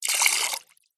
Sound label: human spit water out into metal bowl medium amount